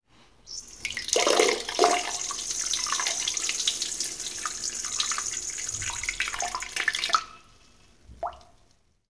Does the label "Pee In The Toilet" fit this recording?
Yes